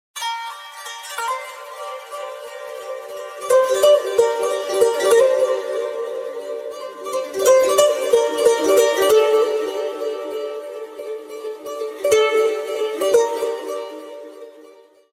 lovely artistic background music ringtone

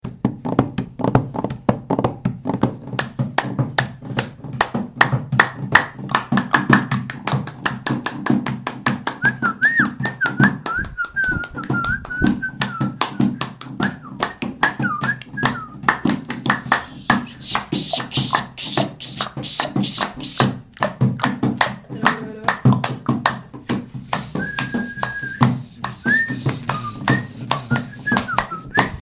hand clap ringtone

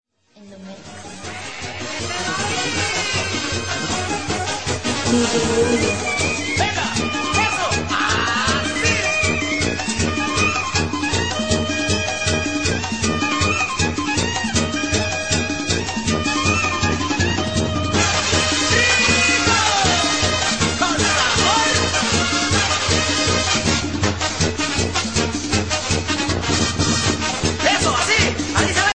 cumbias ringtone

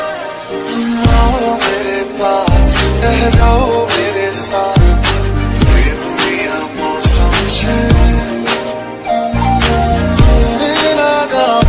bollywood ringtone

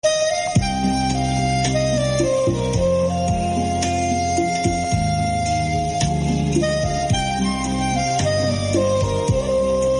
instrumental ringtone

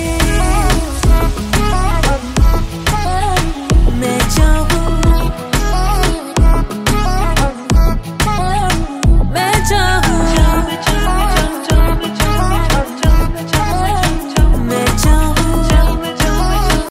music ringtone bollywood ringtones

